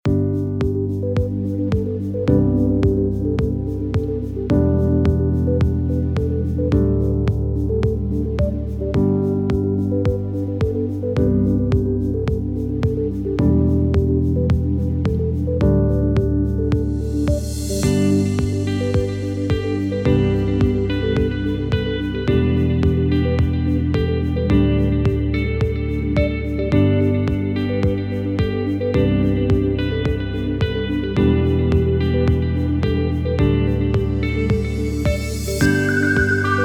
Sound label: Chilled Ambient